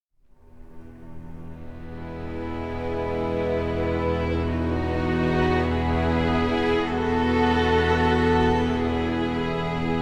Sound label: Peaceful and Relaxing Instrumental Music